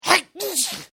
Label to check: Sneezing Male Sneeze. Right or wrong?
Right